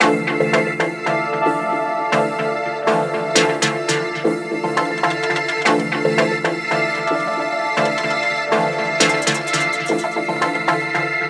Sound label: 170 Am music